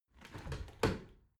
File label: window open tilt